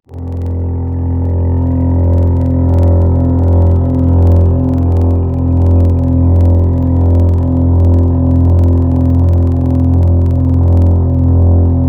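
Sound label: strings Viola, Wavetables; Synthetic's Serum Collection; Standalone-Music SYMPHONY Wavetables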